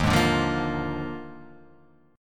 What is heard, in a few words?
Eb Major 7th Suspended 2nd Suspended 4th Eb down; strummed; chord; JGuitar